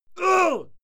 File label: male fight yell; menschen; schreie